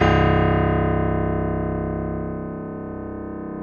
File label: PF C 0